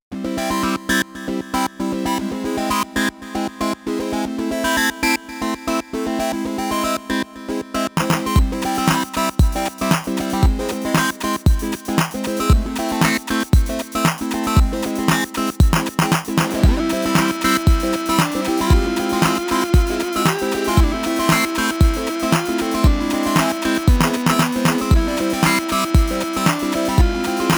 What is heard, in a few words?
Heroic Escapades